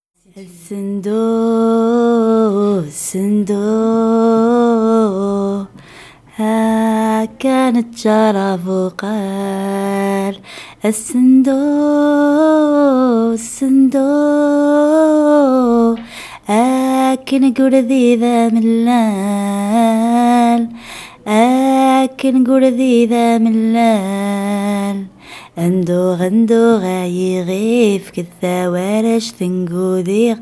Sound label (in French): Chant 2; ABBAYE